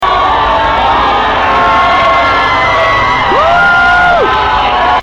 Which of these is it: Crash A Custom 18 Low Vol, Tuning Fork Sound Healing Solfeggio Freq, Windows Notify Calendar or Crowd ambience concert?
Crowd ambience concert